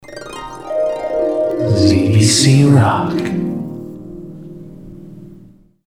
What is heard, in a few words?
harp